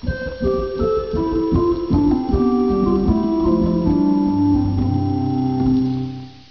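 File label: Rohrfloete 8, organ; Martin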